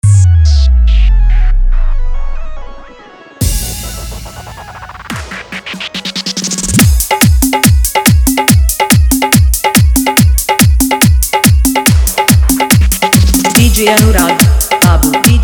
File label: Bhojpuri Dj Song Hard Dhollki Remix Song